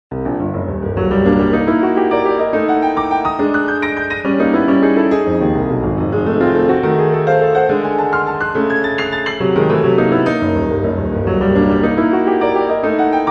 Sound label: Toccata Blues